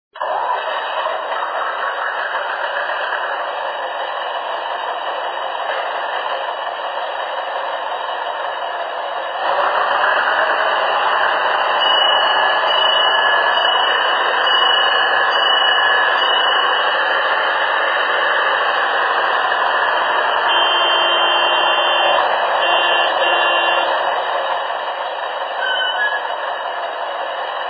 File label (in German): Feldbahn; VL LKM